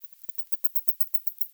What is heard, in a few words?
Eptesicus nilssonii; europe